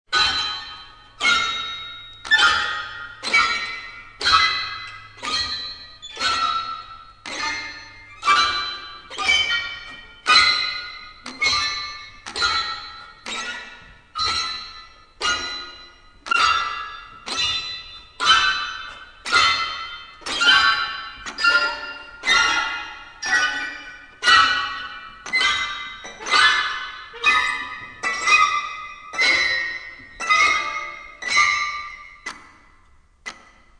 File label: quartz orch